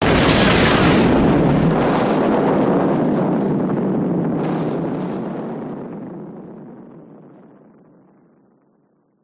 Gun Cannon; audio hero

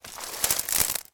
footstep into bushes; foley

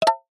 multimedia ui tone short plucked nylon

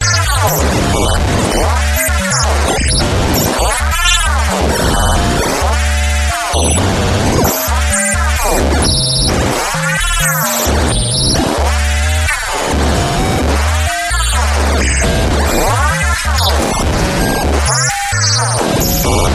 circuits bad chips long; Gregor Quendel

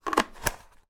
toy water pistol large cap close twist

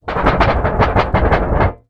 foley tin lid shake wobble; zapsplat

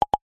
multimedia notification pop message tooltip nudge

